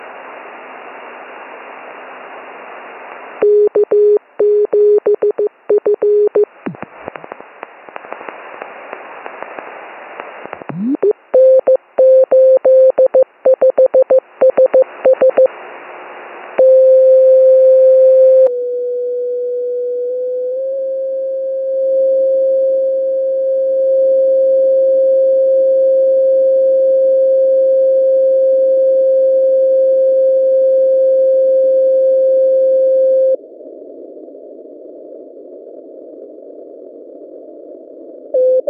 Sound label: Beacons